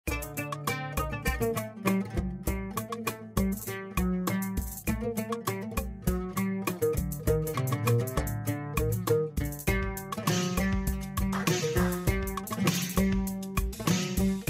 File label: ARABIC BREEZE